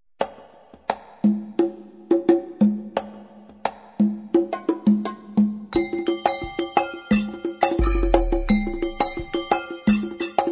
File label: Arab Dance